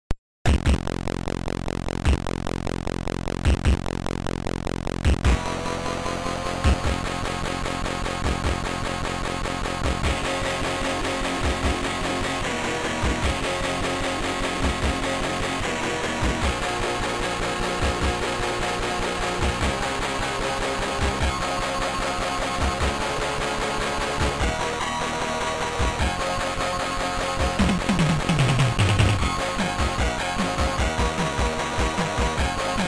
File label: c64 emulator; netherworld